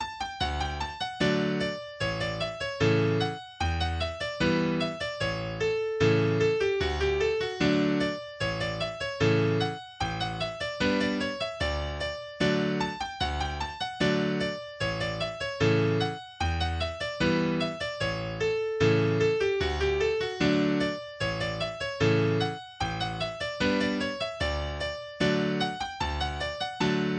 York Hornpipe, english dance tunes; eighty english dance tunes

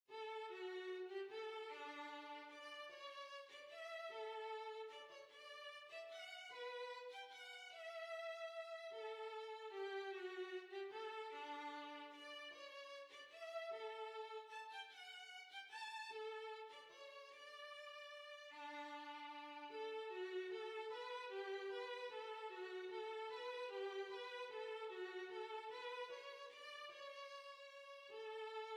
Violin

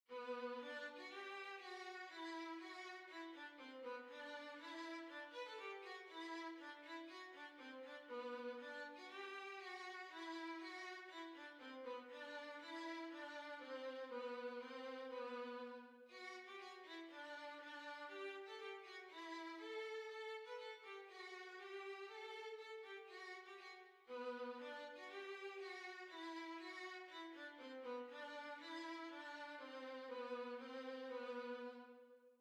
Violin

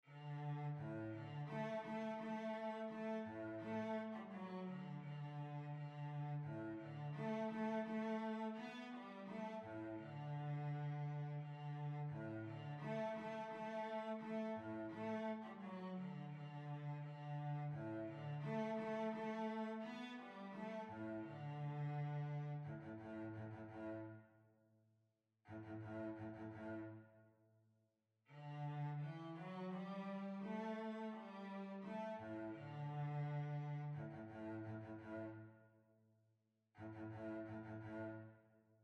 Violoncello; Argiers

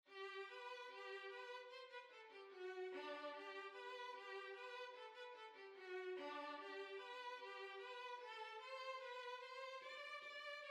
Violin

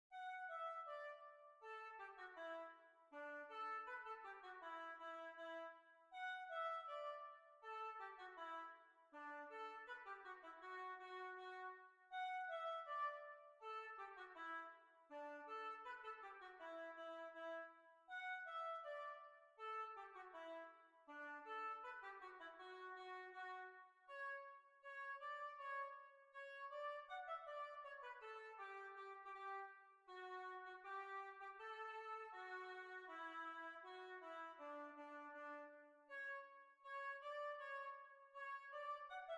Hornpipe

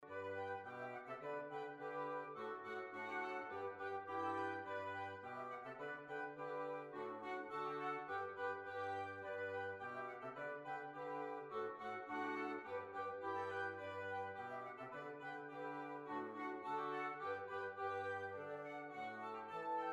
Polka

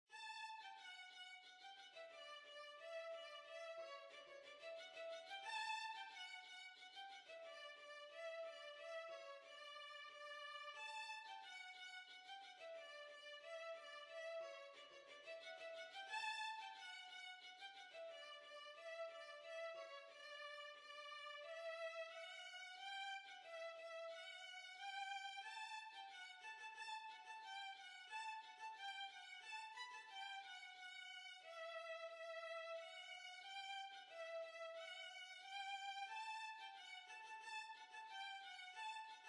Violin

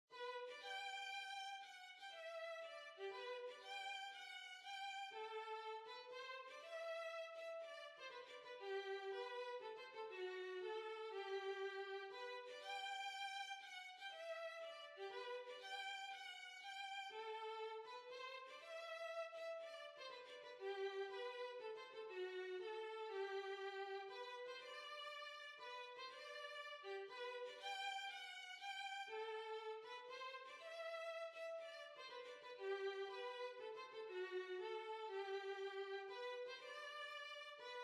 Violin

